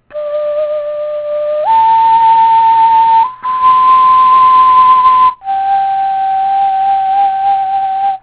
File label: baby Flute